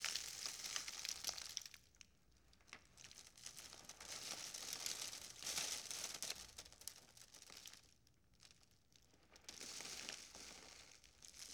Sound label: Wind Foley Sweetener With Debris